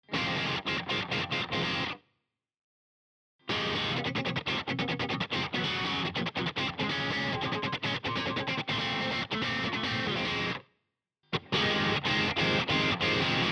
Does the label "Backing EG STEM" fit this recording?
Yes